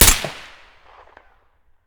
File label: svd silencer mono, weapons